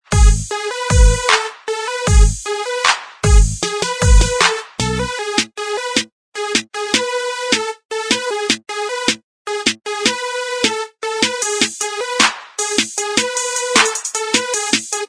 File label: Inst Track, backing tracks